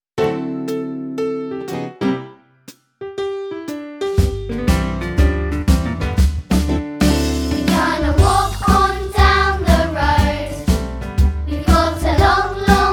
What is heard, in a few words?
Vocal